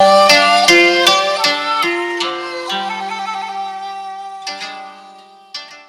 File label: outtro music; phraxathity